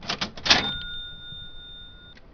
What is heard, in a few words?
cash register